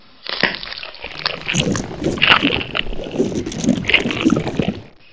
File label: chew victim, Barnacle; Half-Life